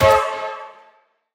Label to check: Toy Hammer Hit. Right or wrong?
Right